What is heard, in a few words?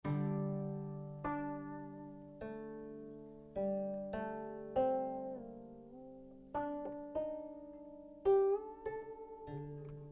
cello koto; hachidan